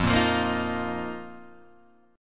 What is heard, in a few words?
F 7th Suspended 4th F down Guitar Half Step Down